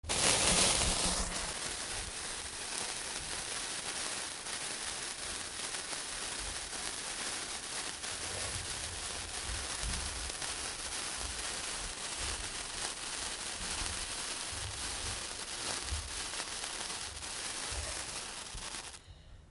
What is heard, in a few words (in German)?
wunderkerze